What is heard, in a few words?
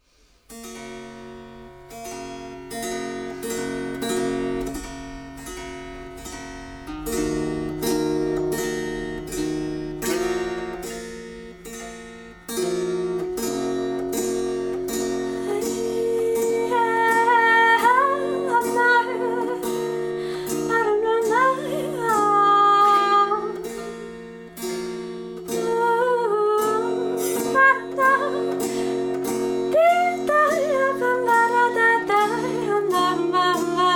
dulcimer